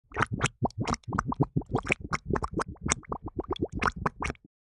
Cartoon drowning bubbles

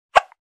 Newborn baby hiccup

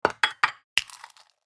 Hitting and squeezing an egg; kitchen

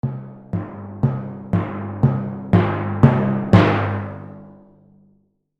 Cartoon timpani drum crescendo fast; drums and percussion

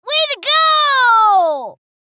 Little girl excited vocalization

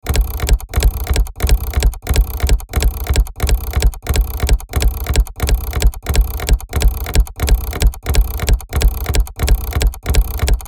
Clicky crunchy mechanical movement loop; Steampunk; gfxsounds

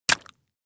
Paint splat on the wall

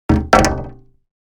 Empty plastic container impact single hit

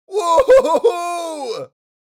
Male win reaction

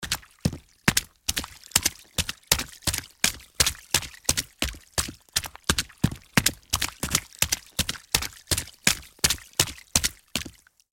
Footsteps on wet street shoes run

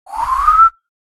Human failed whistle

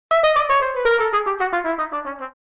Old vintage cartoon falling

